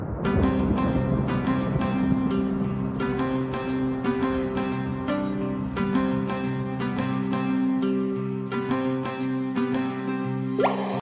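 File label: new guitar music ringtone, bollywood ringtones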